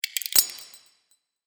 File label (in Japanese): 凍る